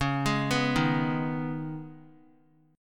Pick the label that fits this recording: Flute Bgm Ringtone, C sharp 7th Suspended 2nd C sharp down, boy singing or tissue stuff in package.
C sharp 7th Suspended 2nd C sharp down